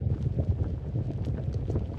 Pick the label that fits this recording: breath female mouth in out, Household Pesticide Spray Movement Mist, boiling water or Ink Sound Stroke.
boiling water